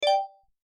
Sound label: new message